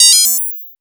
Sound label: MOOG HAT; AMG - Vince Clarke Lucky Bastard